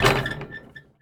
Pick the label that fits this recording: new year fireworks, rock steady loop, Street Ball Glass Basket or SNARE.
Street Ball Glass Basket